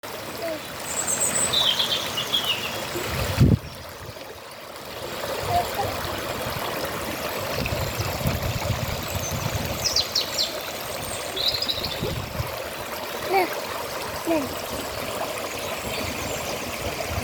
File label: babling brook